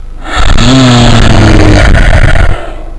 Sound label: stink